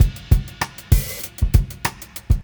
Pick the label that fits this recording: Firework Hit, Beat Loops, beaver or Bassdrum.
Beat Loops